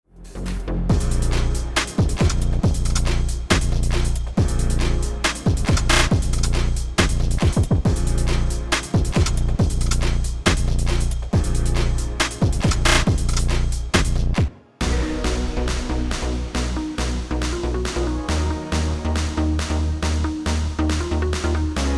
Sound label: Instrumental